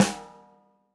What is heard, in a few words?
snare, gretsch kit